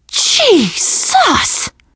violence awe, teen girl, survivor voice; Left 4 Dead; Source Engine

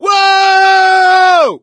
fall, gambler, survivor voice; source engine; left 4 dead 2

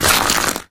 glass, footsteps

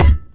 physics cube impact soft

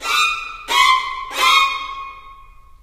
hunter bacterias, music; left4dead; Source engine

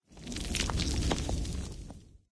rock, materials; ambient; Source Engine; video game

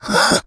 become alert, infected

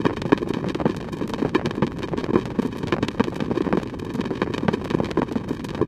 doomsday radiation; Source Engine; tf

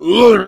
boomer pain short

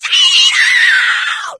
ignite, infected, female; npc; Left 4 Dead 2; Source Engine

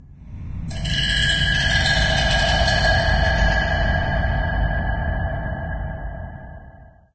amb click stick, ambient organic; video games; source engine; portal2

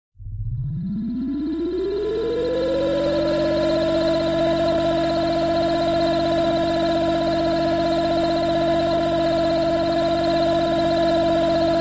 sci fi evil sub start, run, stop; cartoon

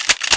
shotgun cock; video game; source engine; portal; weapons; alyx gun

